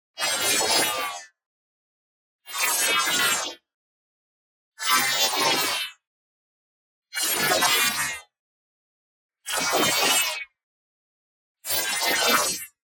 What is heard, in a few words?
ABILITY DEACTIVATE Metallic Swirling Force; boom library; Matter